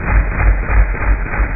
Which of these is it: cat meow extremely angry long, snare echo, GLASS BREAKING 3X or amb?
amb